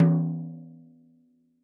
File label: tom hi, gretsch kit